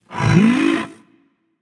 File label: nv breath; smite; voicepack; ganesha